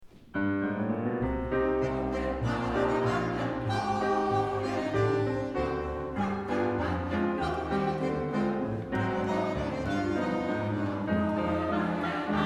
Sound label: Tango Gloria; Prosper magnam